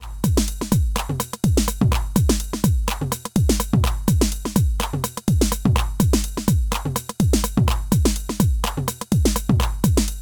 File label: Audiorealism Drum Machine TR-909